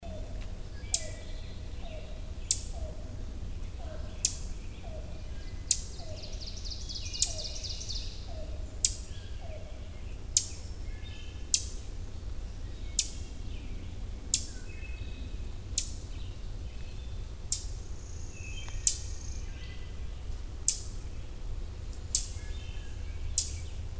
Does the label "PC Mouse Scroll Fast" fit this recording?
No